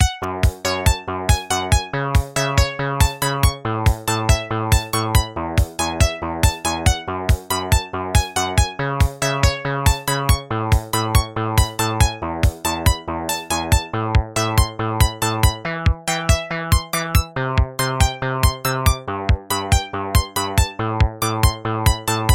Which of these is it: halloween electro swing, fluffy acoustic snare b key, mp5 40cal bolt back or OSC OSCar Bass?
OSC OSCar Bass